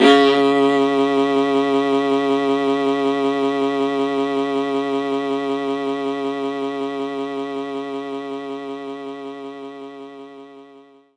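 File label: Viola C sharp 3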